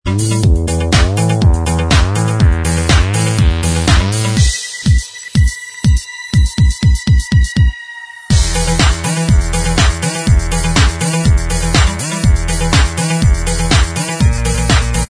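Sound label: instrumental, backing tracks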